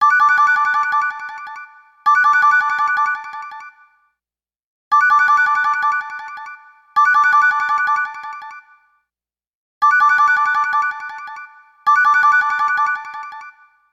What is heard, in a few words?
Echo